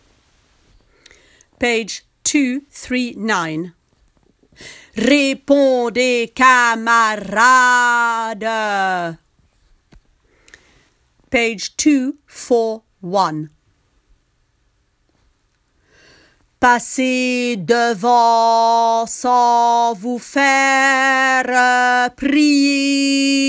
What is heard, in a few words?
bas; Carmen